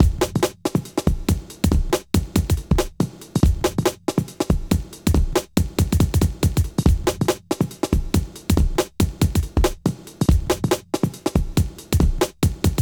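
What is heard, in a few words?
drum n bass; Funky Xtreams; INIS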